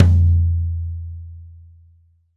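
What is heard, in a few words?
TY Low Tom, Roland BK-5 Toms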